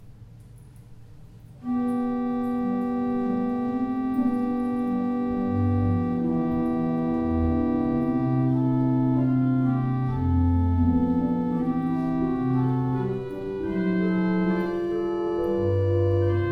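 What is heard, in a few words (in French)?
choral, orgue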